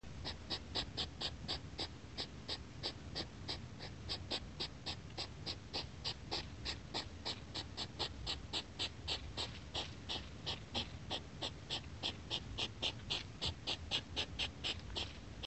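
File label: hedgehog sounds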